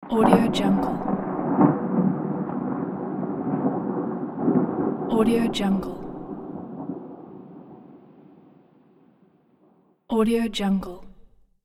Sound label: real thunder bad weather